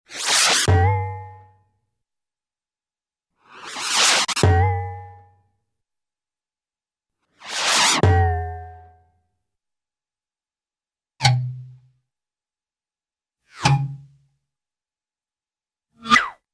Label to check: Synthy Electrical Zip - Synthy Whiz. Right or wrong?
Right